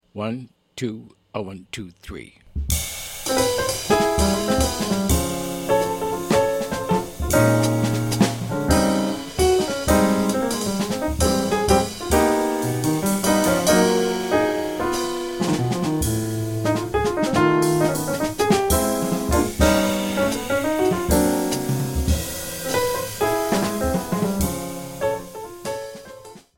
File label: minus bass